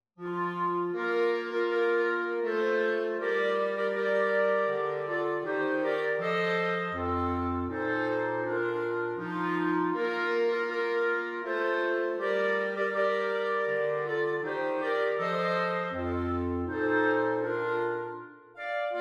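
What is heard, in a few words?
o christmas tree Clarinet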